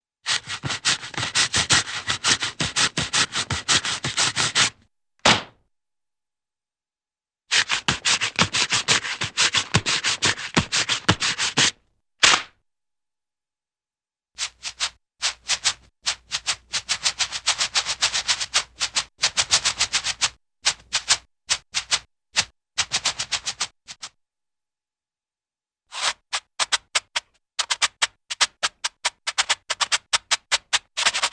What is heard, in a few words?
Rhythmic Rough Cloth Shining Shoes - Rhythmic Sweeping, cartoon; Hollywood Edge; Cartoon Trax